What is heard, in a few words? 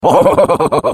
laugh, ru; Defective Turret; sp sabotage factory defect